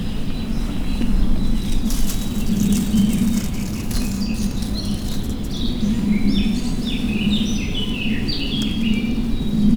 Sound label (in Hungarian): gyík a zavarban, erdő; Visegrád